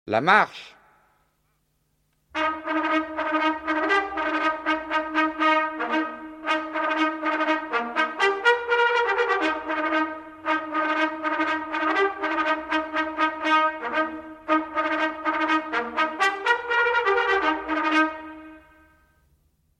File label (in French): trompette de cavalerie; la marche